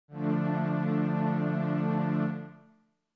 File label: D D Minor